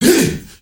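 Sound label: MALE HEHA, SHOUT; Spectrasonics; Heart of Africa 1; CH. SHOUT2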